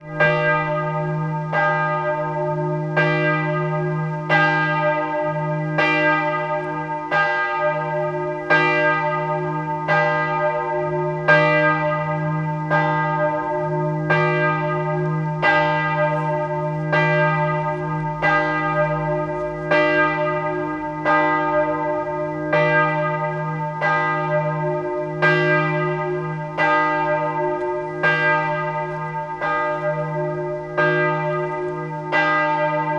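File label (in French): Cloches de l'église; MZ-N710; Sail sous Couzan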